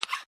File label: send message; Dargalon